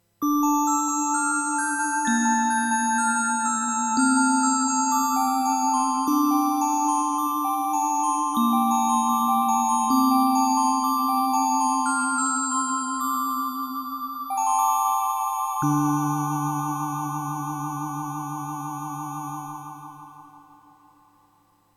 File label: Z1 Samples Fm Bells